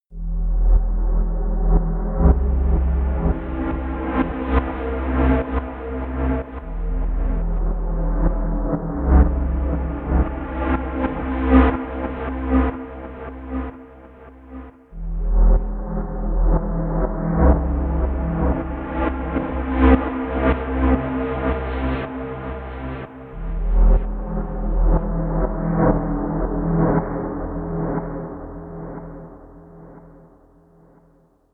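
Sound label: glitchy trance, pads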